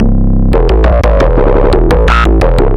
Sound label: Synth, Drum n Bass; KJ Sawka; Drum n Bass & Beyond